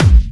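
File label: BD Clubby, bass drum; VEC1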